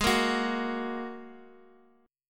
G sharp 7th Suspended 2nd, Guitar, DADGAD; x,x,x,1,1,4; down; chord shape

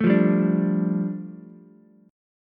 A 7th Suspended 4th Sharp 5th, A, down, Ukulele; A7sus4#5 strummed

